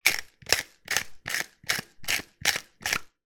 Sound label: salt or pepper grinder grinding